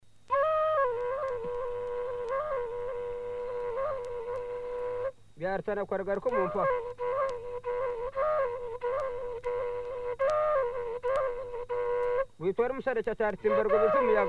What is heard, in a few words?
Omubanda Hima, uganda